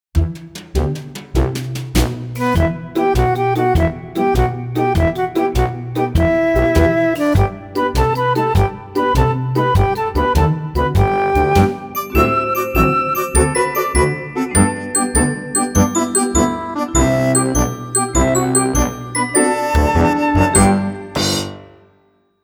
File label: Picked up Galops Solo B